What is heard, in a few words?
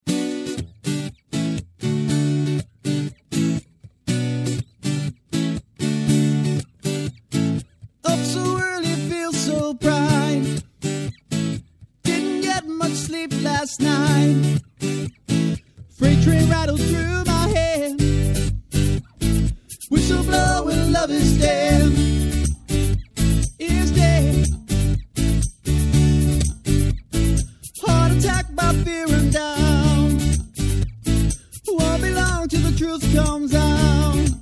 Acoustic shoes